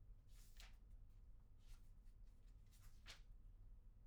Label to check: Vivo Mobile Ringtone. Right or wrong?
Wrong